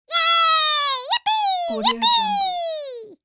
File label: cartoon voice yay yippee